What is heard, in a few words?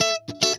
FUNK N CHUNK, FUNK GUITAR; Greytsounds